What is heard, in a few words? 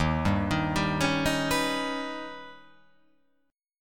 D sharp Augmented 7th D sharp